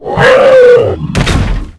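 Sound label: Berserk death; lmctf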